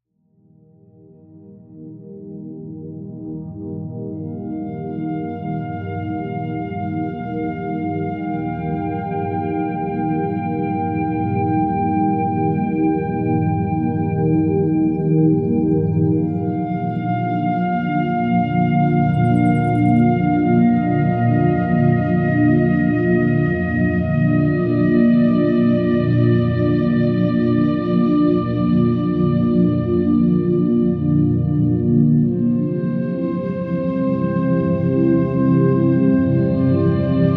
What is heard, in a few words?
PositiveThinking, binaural; PowerNap; Meditation